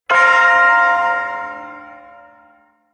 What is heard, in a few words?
Shawzin Pent Min Chord I; Warframe; Duviri Ersatz Shawzin